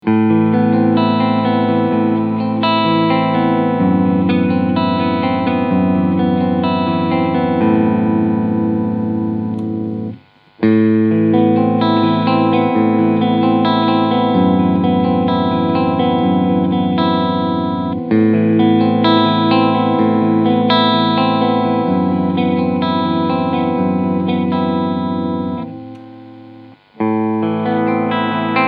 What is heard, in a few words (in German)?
Jackson JS32 Dinky E-Gitarre Cleansound alle Positionen